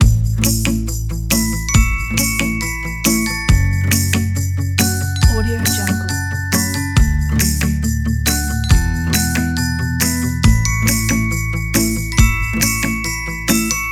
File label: kids